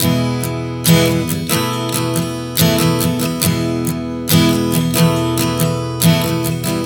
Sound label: Strum 070 E, One Chord Strumming 070 bpm; Acoustic Guitar; Sonic Foundry (Sony Creative Software); Songwriter Acoustic Guitar Companion